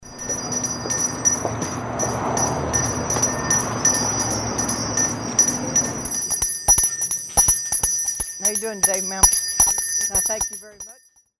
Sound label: army bell ringing